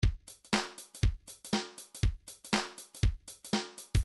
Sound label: reggae 2 bar